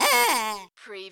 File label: CARTOON SILLY HORN HONK